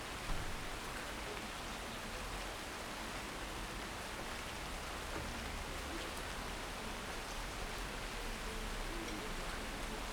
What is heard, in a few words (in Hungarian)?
szállás erkélye, dörgés majd egy autó, mennydörgés; thassos; skala potamia